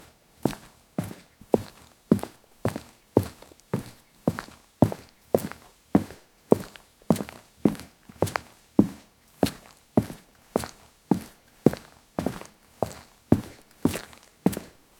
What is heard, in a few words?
light footsteps on the gr